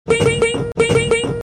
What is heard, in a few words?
ting ting ting